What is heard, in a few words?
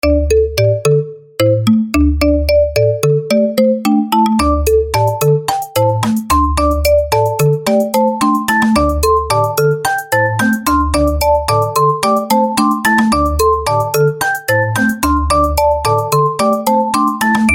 Loud Bells